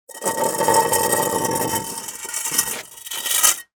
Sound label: Just Metal Scrapes and Scratches; soundbits